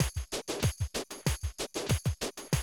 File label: Classic DrumLoops